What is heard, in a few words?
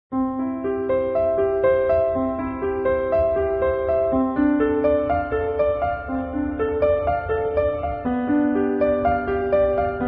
learning music